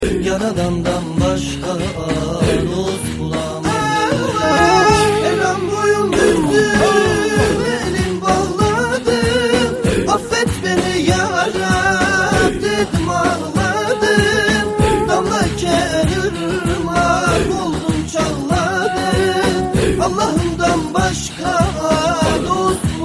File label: islamic Ringtone